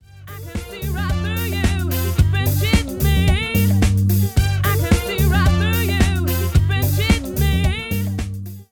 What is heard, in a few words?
Full Dry